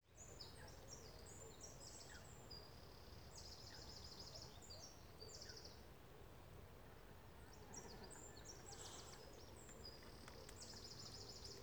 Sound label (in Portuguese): Carriça